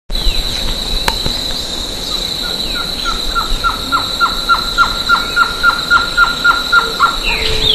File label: Trogon surrucura; PN Chaco